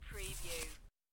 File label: Playing Cards Gather Cards From Table